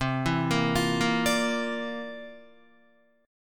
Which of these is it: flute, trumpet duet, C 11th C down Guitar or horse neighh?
C 11th C down Guitar